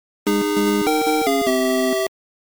fanfare; Super Mario Bros. 2

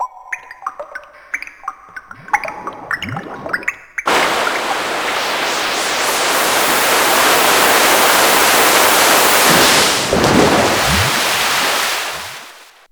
Magic Water; New Super Mario Bros. Wii

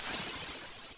Slide Ice

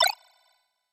Item Grab; Super Smash Bros

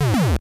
Classic Explosion; New Super Mario Bros. Wii

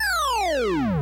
Flag Pole; New Super Mario Bros. Wii

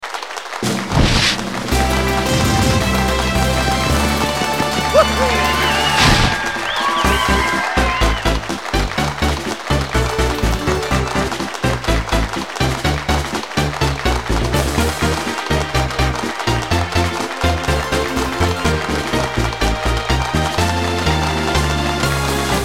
level up; Paper Mario 2 The Thousand Year Door

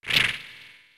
Chain Grab; Link; Super Smash Bros

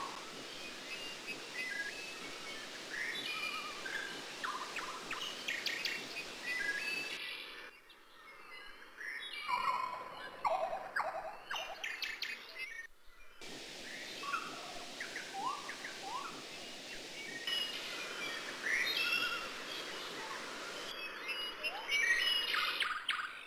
Forest Ambience; New Super Mario Bros. Wii

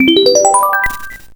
Press Start; New Super Mario Bros. Wii

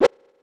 Rapid Sword Swish; Link; Super Smash Bros

